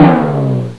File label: broken drum sound; Sound Effects for Multimedia - Volume 1